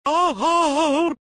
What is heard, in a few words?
bwah, reverse